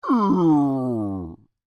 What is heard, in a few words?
Creature Moan Dissappointed, Human; Groaning; Voice; Big Room Sound